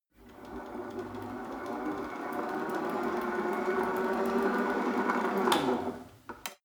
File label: rope trainer pull sequence slow, fitnessstudio